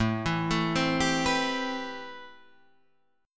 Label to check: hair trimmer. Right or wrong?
Wrong